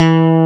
E Bass Hamr; Club-50 - Foundations Roland

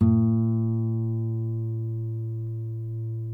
NYL NV B, nylon no vib guitars; Spectrasonics; Hans Zimmer Guitars VOL-1

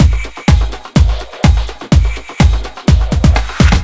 Fill, Drum Loops 125bpm, Nu Dance Electro; Groovin Noise

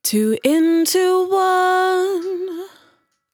100bpm Bm, Velvet Vocal; Zero-G

